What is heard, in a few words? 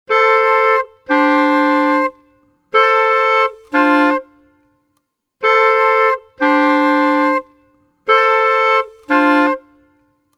90 a min woodwinds, Orchestral arrangements